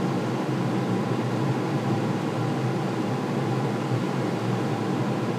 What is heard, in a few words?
amb industrial, ambient atmosphere